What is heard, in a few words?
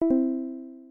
balloon Drop F; balloons-and-static-electricity